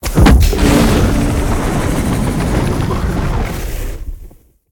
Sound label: Fire Flames; smite; god merlin